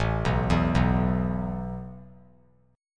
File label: A Suspended 2nd A; Asus2 arpeggiated